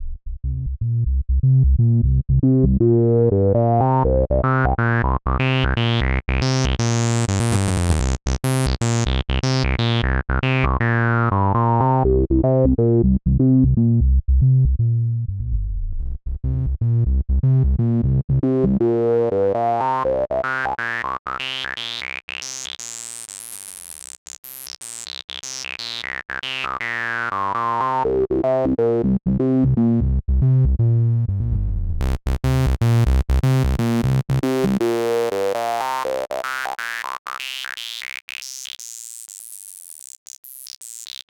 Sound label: state variable filter; synthmaster